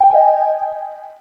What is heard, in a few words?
shutter; opsu-dance